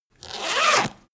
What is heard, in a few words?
zipper midsize run; objekte; reissverschluss